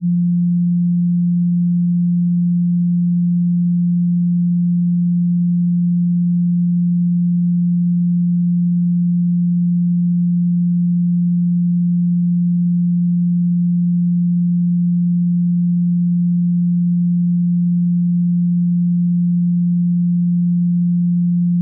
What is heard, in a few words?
175Hz